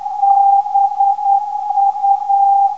321kHz, ndb

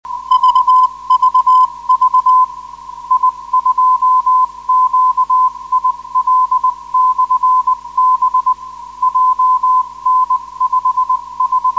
7038 kHz, hf beacon; i2qil

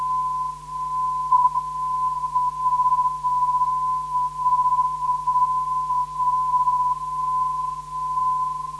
WLU 346kHz, ndb

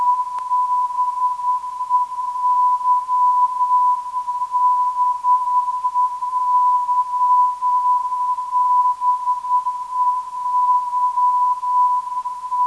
487kHz, ndb

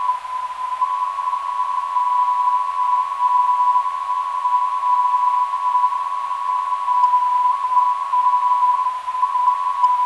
493kHz, NDB

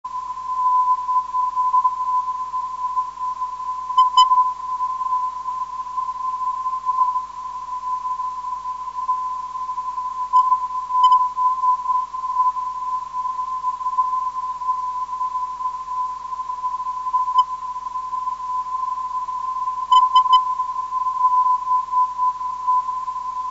318khz

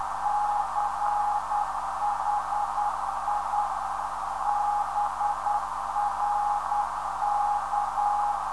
406kHz, ndb; CHI BRZ

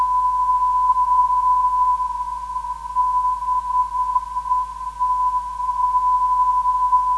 401.5kHz, ndb

